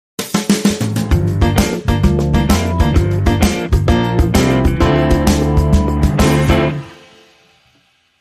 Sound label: Shuffle Up Swingy